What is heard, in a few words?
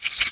Stab; TR3; Willard